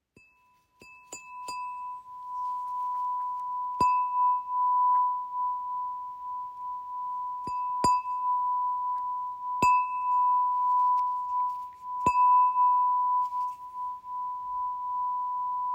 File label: Chime 999Hz